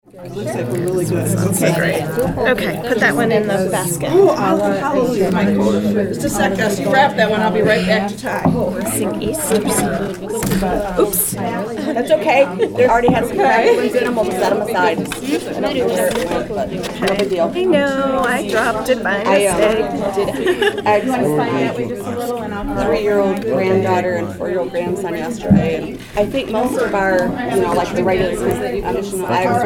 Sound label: Holy Trinity Greek Orthodox Tsougrisma